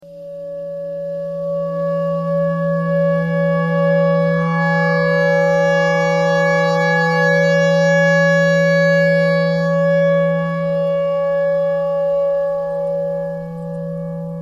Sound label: clarinet notes e clarinet thread noise